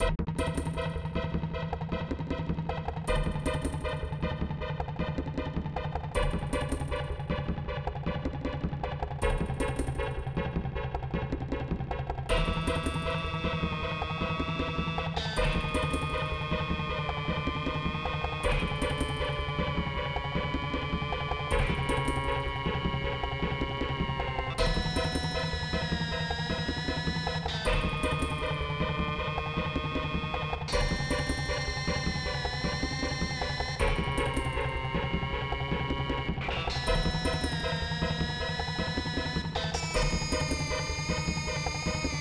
Cave; Jungle Wars 2; snes

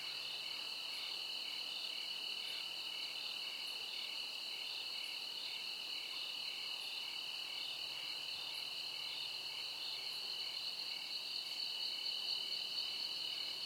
crickets; Level4 Hidden World

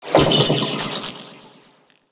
Damage

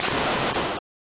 SFX Water

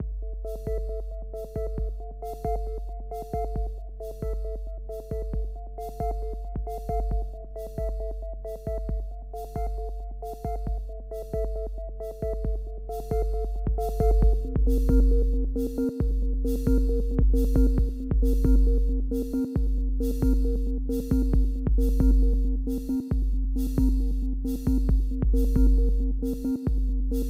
Electronic Layer; Chroma Shift

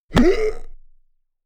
Voices Zombie Hit Groans

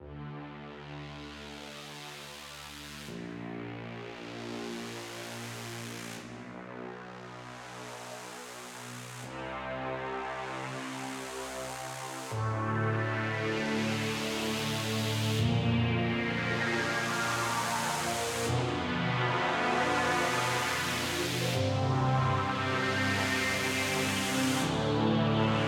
End Build KEYS; transistor

